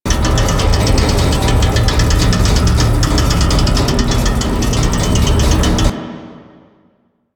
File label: Library Floor Gate Loop; Duviri; Library Island